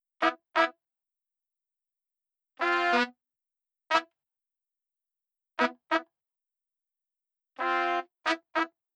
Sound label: horns; Welcome Mat